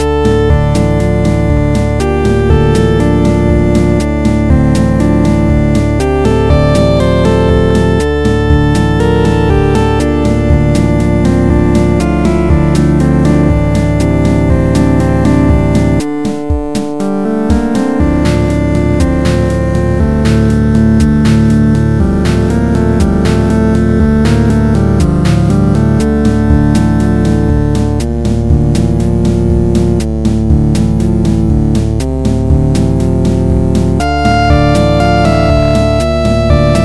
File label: intense